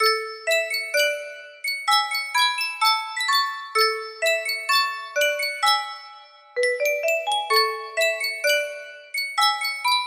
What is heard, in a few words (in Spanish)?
sankyo caja de musica